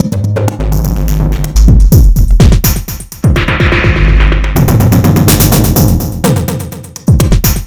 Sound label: ELECTRO SET; Bass; Sonic Foundry (Sony Creative Software); Not Just Another Pretty Bass